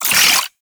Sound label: Low Clip; Weapons; Warframe; Tenno; Oraxia